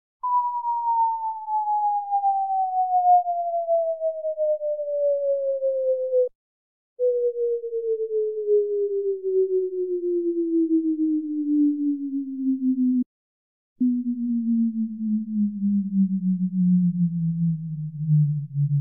Sectional sweep tones down in 1 octave steps- 1kHz-500Hz, 500Hz-250Hz, 250Hz-125Hz; Alan Parsons & Stephen Court - Sound Check 1993